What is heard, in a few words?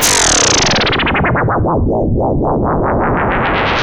Swoop Sweep; Big Beat